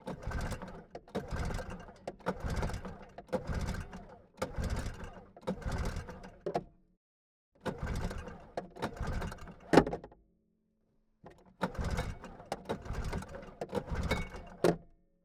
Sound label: Yamaha, ET, 340, False Start, Pull Starter Cord, Engine, Intake; DPA4062; 1978